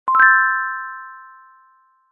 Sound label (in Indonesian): Muncul Score